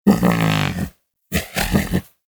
Vocal Snore; Hunting Critters; Predasite

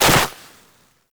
Land Soft Snow, Footsteps; Characters; Operator

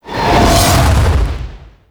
Golem Melee Swing; Warframe

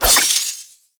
Spectral Sword Slash Start; Naramon; Focus; Ultimate Abilities

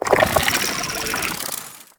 infested fishing fish caught; Weapons; Infested Fishing Spear

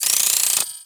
Credit Pickup Pile Large; Warframe; Pickups

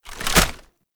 Rocket Launcher Reload; Warframe; Weapons; Tenno; Lasrian

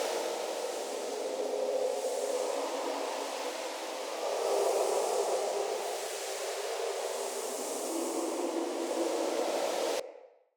Bow Charged Loop; Weapons; Player; Bows; Fey Thorn Bow

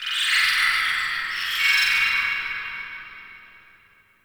High Tech, Ambience; Guild; Warframe; Misc Remaster

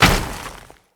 Moa Step Snow, Footsteps

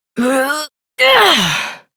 Exertion, Adult; Warframe; Adult Operator; Dialog Barks

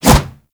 melee kick whoosh; Warframe; weapons

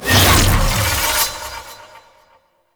Blades Cast; Warframe; Garuda

